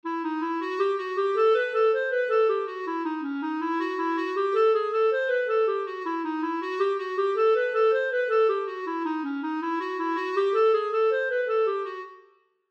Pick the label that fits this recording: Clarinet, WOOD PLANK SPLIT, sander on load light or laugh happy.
Clarinet